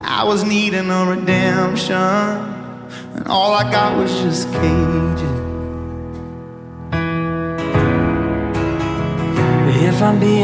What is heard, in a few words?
Acoustic Live